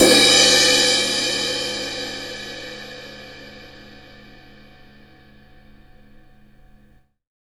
CRASH18; Big Fish Audio; Ross Garfield Drums VOL-2; CYMBALS

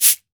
metal cabasa; Brazil

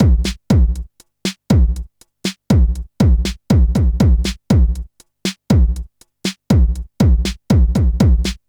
TECHNO; INIS; Funky Xtreams

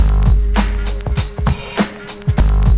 BEAT, Beats 100 bpm, Hip Hop; E-MU Formula 4000 Series Vol. 1; Hip Hop Nation

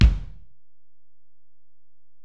Ac BD, Alesis DM-PRO; 300 Drum Machines

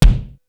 KICK, Hip Hop

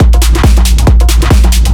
drum, 138, drum-loops, Techno; Techno ID; fatboom

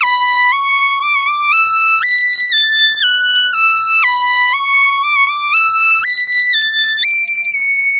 Gangsta Whine; Sonic Foundry (Sony Creative Software); Club Joints and Street Anthems; Drop Top

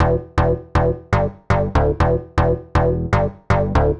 Digi Bass (120BPM), retro house

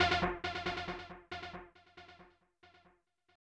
Juno Riff F, 137bpm; Big Fish Audio; Old Skool House; OSH Construction Kits; OSH Belfast

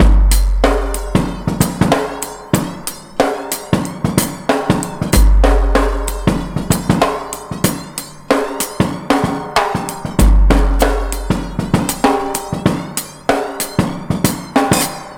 99 bpm, break; ONE HAND; 90 s Sample CDs; Planet of the Breaks

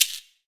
R GUIRO, Drum Mashines; EdgeSounds; Drum Mashines VOL-1; R-100 DRUMS

